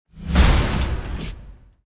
Skill Assault Smash

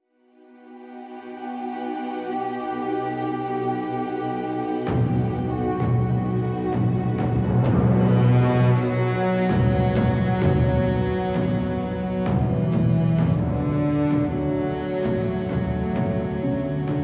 music reveal; Reigns Game of Thrones

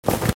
Parachute; The Henry Stickmin Collection

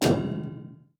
Bump

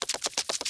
Flukeman Crawling Half Run Loop

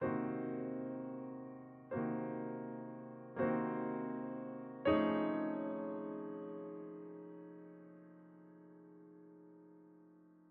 Museum Boss Piano

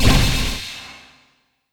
Elementaliste Skill Spell

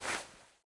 Darts

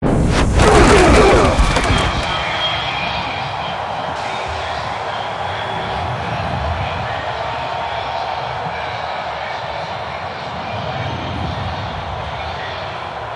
Sfx American football

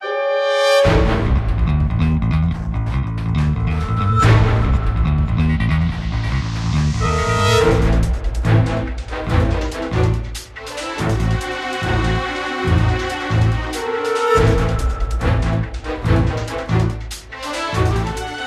Tension Emotion 142 4-4

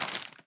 Footstep Fertilizer

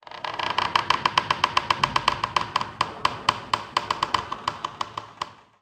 Hat Creaks

